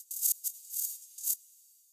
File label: hh roll fx; drumkits; fl studio; goodtakimu drumkit